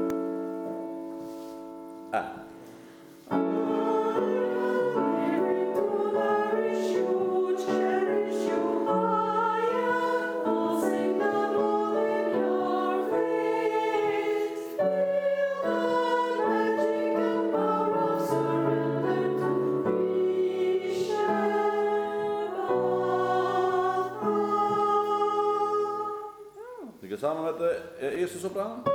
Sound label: mezzo